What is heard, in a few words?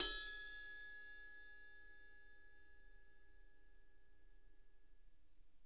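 ride bell; Sonic Reality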